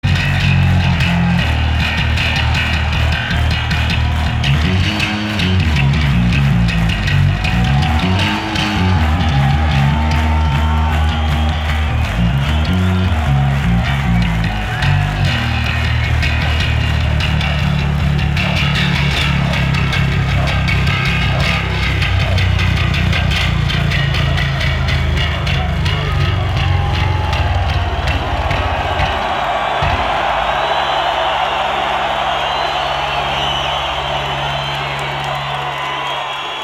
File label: Bass Solo